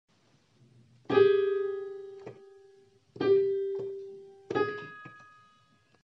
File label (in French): demi ton